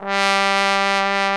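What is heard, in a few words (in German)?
POSAUNE VIBRATO; Best Service; Alpine Volksmusik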